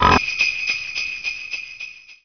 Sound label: jump